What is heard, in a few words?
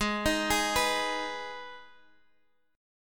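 Ab Minor chord, Guitar, Half Step Down tuning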